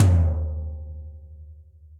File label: Tom Floor Big Punchy; Drum Hits; Ableton; Factory Packs; Session Drums Club; MPE Hits